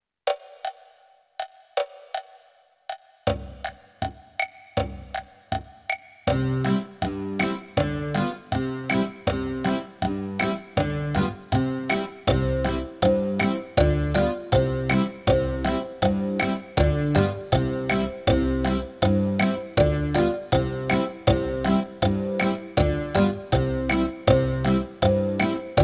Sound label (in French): Le long des collines playback